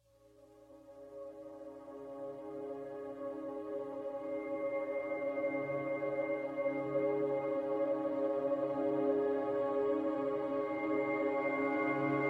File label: karaoke